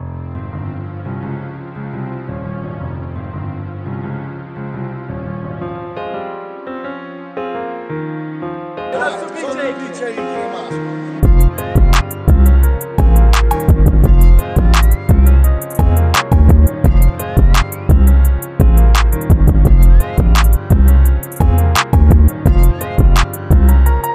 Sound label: type beat